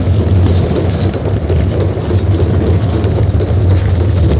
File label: roll windows, terrains; Tony Hawk's Project 8; XBOX